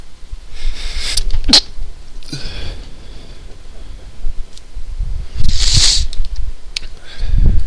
stifle, male

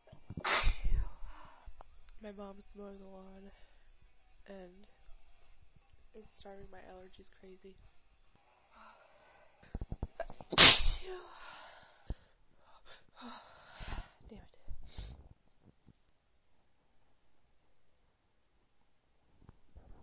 allergy attack, female

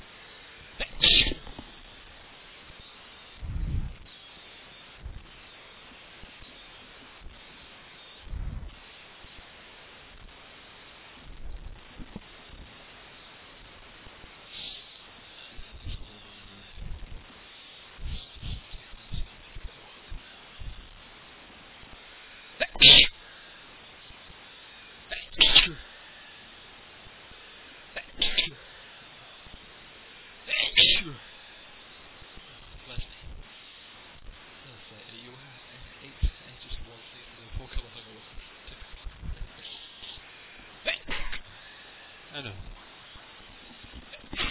big cold sneezes, male, high

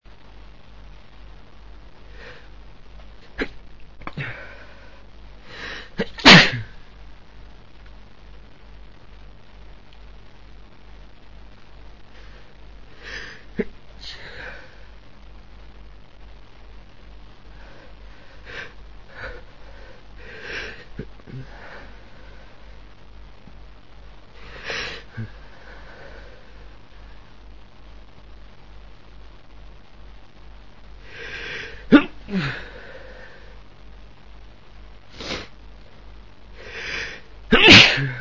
stifle attempt, male

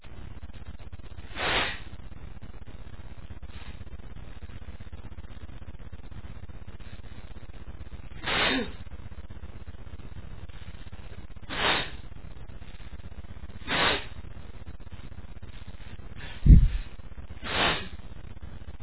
freshly cut grass, male, achoo

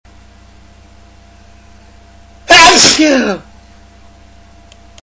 real sneezes, male

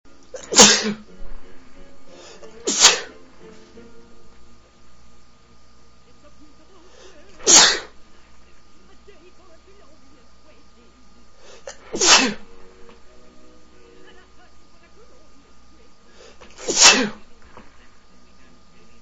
With Music, male, sneezes

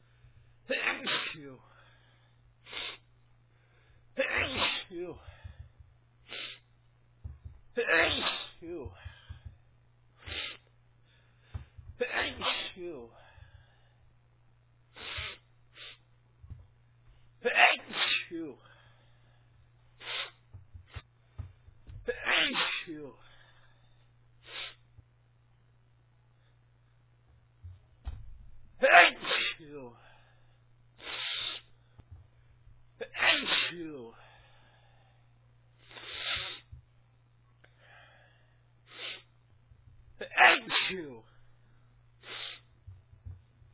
aachoo, male

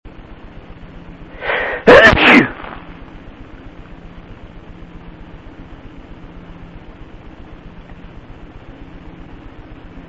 loud, male

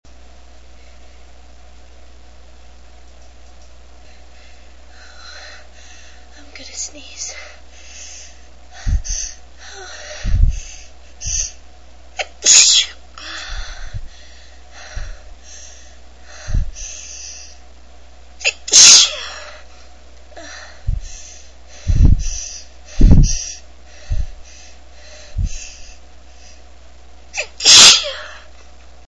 gonna sneeze, female

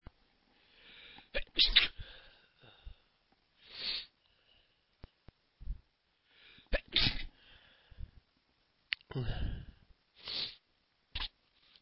no alarms, male

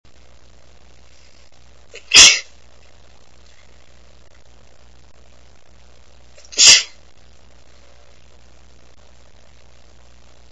soft sneezes, female

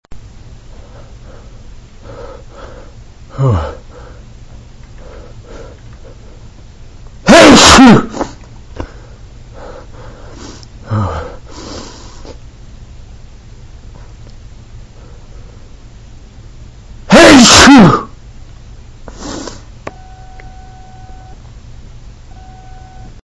morning sneezes, male

